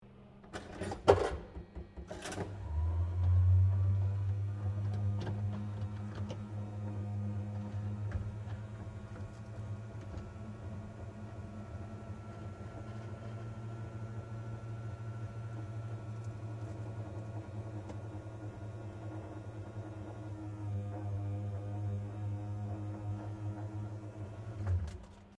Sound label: SLOT MACHINE CLAW SKILL TESTER OPERATE, Various old coin slot machines; Casinos